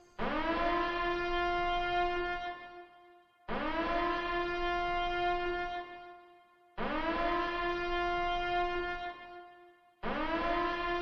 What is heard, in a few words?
claxon; Last Day of Camp